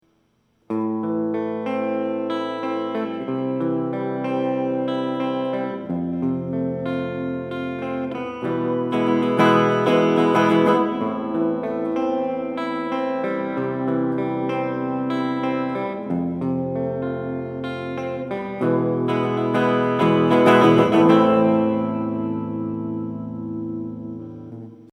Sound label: electric guitar; EPOS B20 cardioid